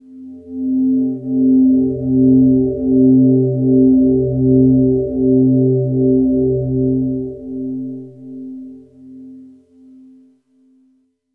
E-Mu Proteus FX TwinMood C2

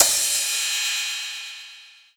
M Audio Venom Crash Cymbal

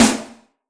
Yamaha RM50 Snare Drum

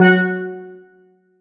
Steel Drum C4

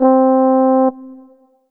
Alesis S4 Plus Flugel Solo C4

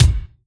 Yamaha RM50 Gated Kick

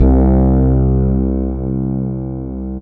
Roland PN-D10 Fretless Bass C2

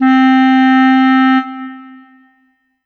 Roland JV-2080 Clarinet mp C4

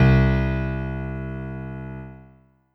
Yamaha EX5 Jazz Grand C3

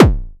Pulse Kick, Drums - Kick

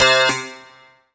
Yamaha TG77 Harpsichord C3

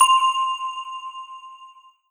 Roland SC-88 Crystal FX C6

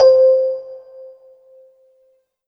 Korg M3R Celesta C5

